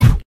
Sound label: puff